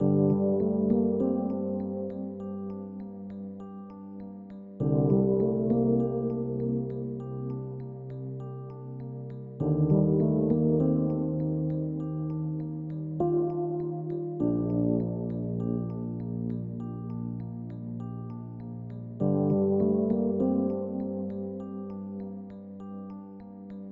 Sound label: Piano That is Sad; friday night funkin vs. agoti; Prologue